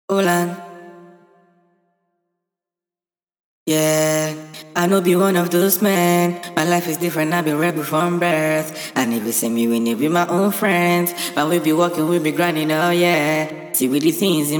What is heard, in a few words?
Acapella